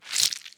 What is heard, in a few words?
Slime